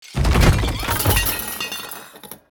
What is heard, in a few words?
patty crash; emerald-crossing